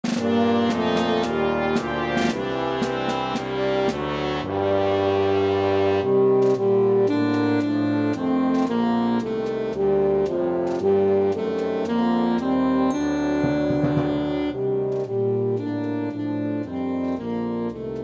Saxophone Track with Melody; God Rest Ye

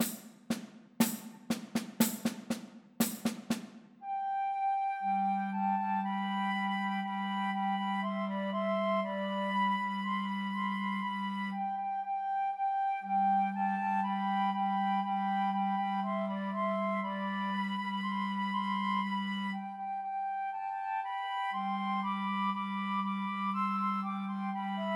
Christmas Trios for Two Flutes and Clarinet

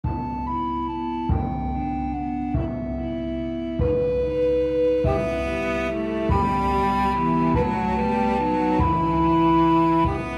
Cello Track with Melody; We 3 Kings

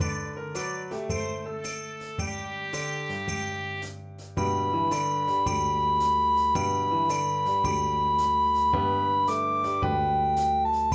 Accompaniment and Melody Recorder; Jingle Bells

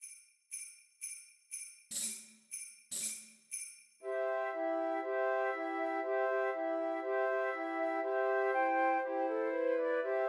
Christmas Trios for Three Descant Recorders

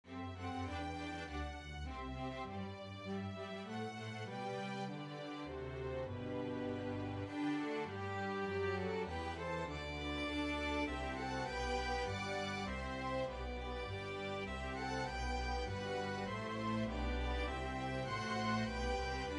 Violin Track with Melody; The First Nowell

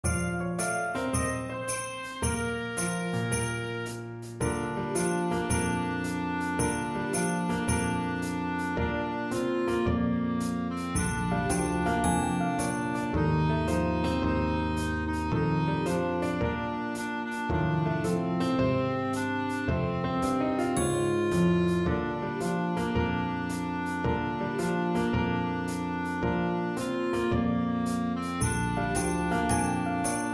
Jingle Bells Clarinet with Melody

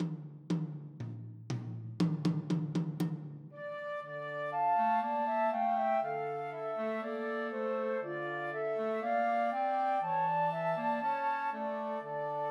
Christmas Trios for Two Flutes and Clarinet; God Rest Ye Merry Gentlemen

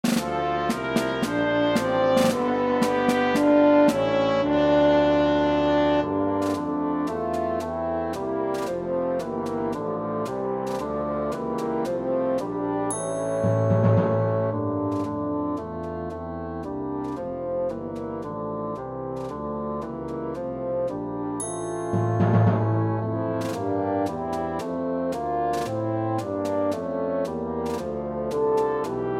Tenoroon Track with Melody; God Rest Ye

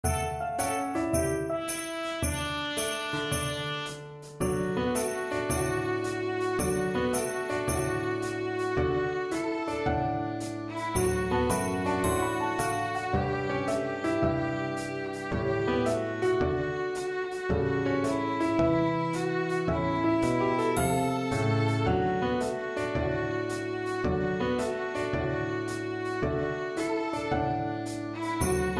Violin Jingle Bells Track with Melody

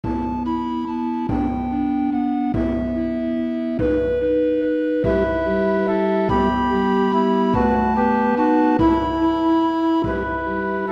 Flute Track with Melody; We3Kings

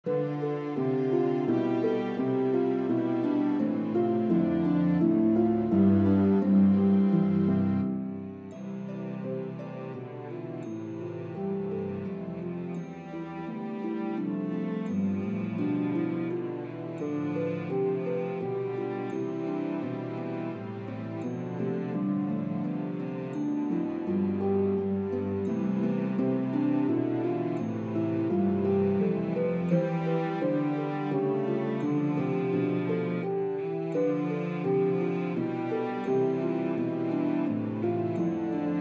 Cello Track with Melody; Away in a Manger

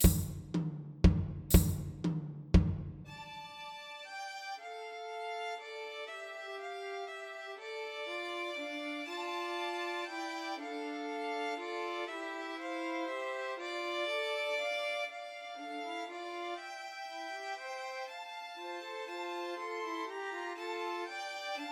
Trios for Three Violins; We Three Kings; Christmas

